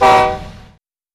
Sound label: Train Honk Horn Clear; Mike Koenig